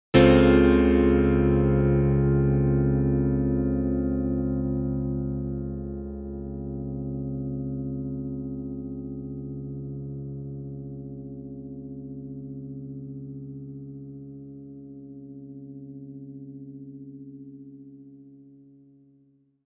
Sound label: cp80 pan; u-he uhbik